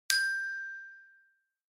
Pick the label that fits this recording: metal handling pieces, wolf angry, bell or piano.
bell